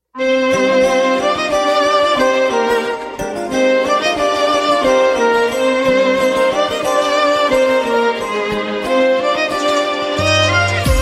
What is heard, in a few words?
new violin ringtone